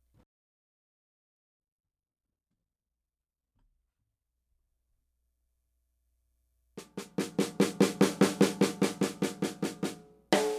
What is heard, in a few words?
brass